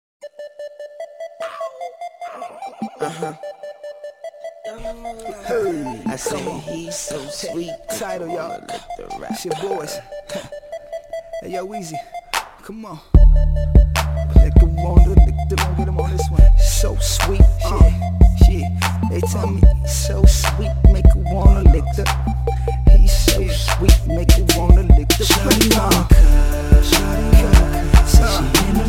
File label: Rnb Bass